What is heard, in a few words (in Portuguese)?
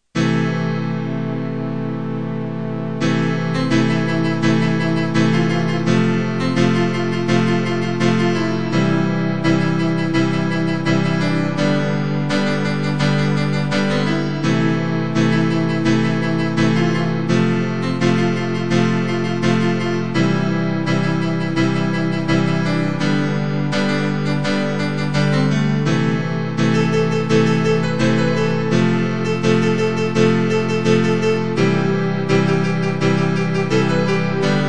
musica de forro, part no som da viola